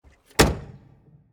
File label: car door close; Film Noir; SoundPad